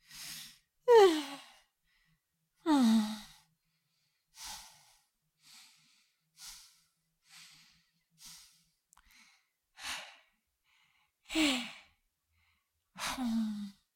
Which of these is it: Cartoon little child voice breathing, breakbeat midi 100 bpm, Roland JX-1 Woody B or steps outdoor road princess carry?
Cartoon little child voice breathing